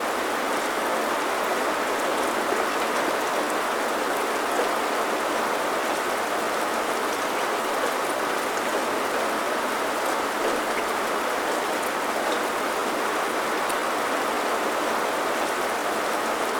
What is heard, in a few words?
Rain Sound Meditation; Rain Sounds